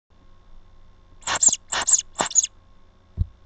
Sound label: axe